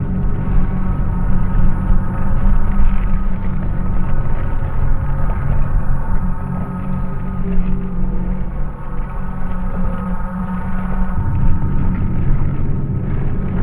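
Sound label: lithoid ambient cruiser hum; Stellaris; lithoids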